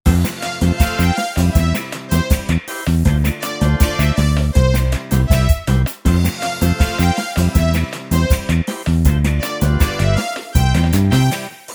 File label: Karaoke